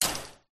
metal pole hollow small hit strike metal fence chicken wire